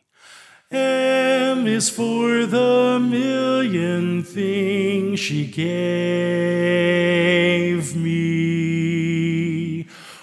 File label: Lead